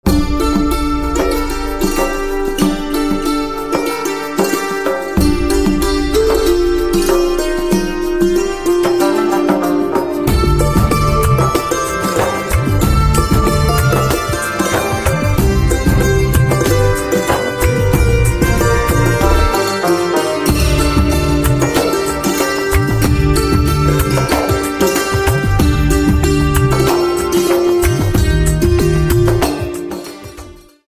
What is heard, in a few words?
Santoor, instrumental ringtones